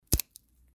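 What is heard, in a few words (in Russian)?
разломили тонкую косточку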